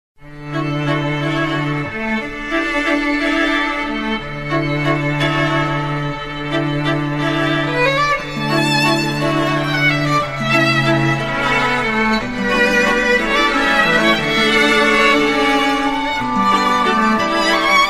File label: midi ring